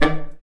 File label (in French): sax accord